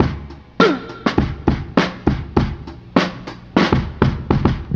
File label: ooh, Drum loops, Funky